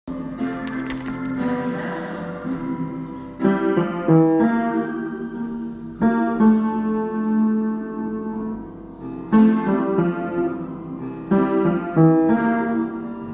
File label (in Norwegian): salige visshet bass; åfjord